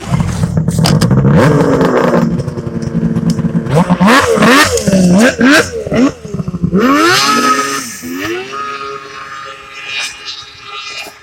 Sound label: Yamaha srx 700